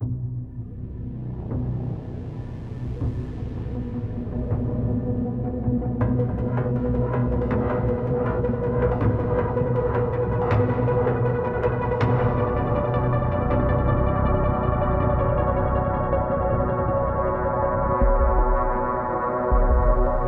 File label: Battle Cue Ambient Layer; Battle Planet Judgement Day